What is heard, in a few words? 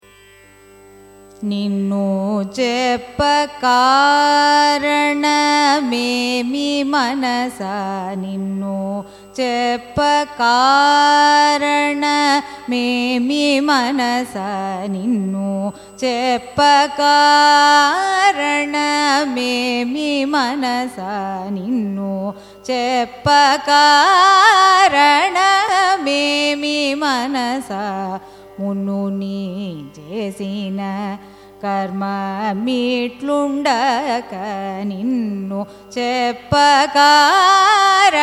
raga mandari carnatic; carnatic music